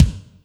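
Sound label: kick Hi Foot RM, Alesis D4 drum machine; 300 Drum Machines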